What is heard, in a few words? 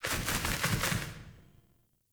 Sparrow Death State Five Wing Flaps; Animals